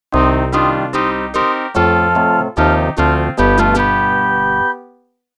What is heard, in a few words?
game over